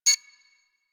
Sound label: Bridge Comp Beep; Warframe; Hub; Liset Bridge